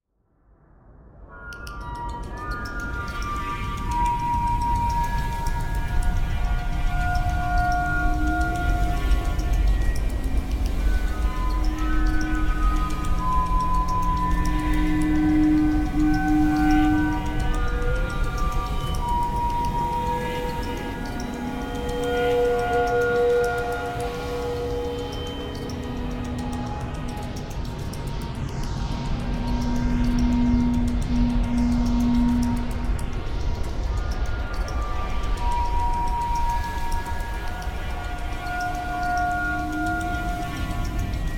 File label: music ambient alien; gemini wars windows gamerip 2012